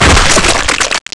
death; quake2

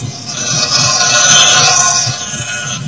slicer; quake2

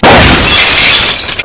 glass; quake2

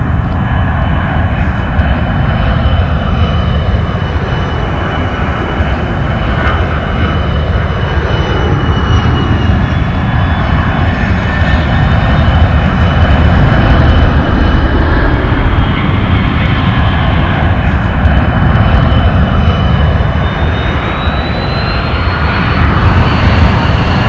jet; quake2

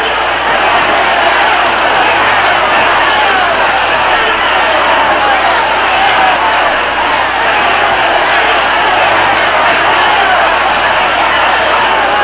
cheers; quake2

